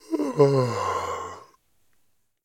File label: male yawn; CosmicRage